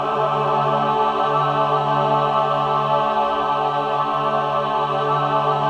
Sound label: SUS D4, SUS.CHORD, Symphony of Voices